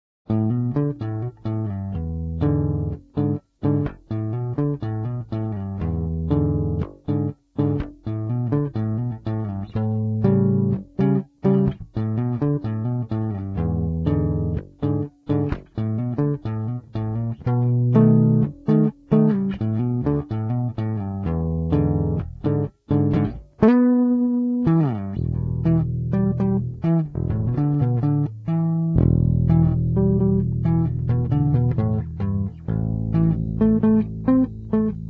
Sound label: Guitar Electric Bass; Mary Had a Little Lamb